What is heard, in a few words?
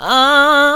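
oahh, female vocals, right; PlatinumPhattCD2; E-MU Producer Series Vol. 8 – Platinum Phatt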